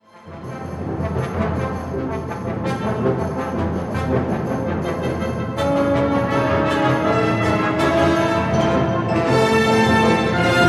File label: Concerto for Brass